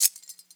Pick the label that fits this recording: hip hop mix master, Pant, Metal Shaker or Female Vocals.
Metal Shaker